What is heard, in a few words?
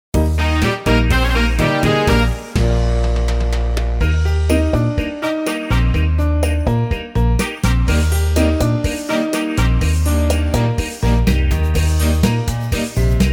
cha cha